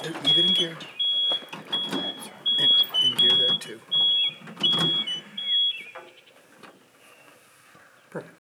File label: Tractor Shifting